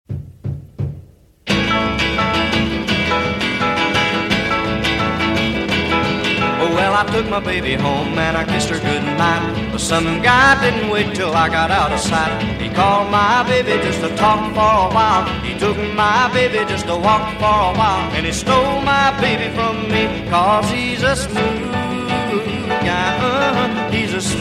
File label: Doo Wop; Wuschel's Doo Wop Paradise